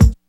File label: TAM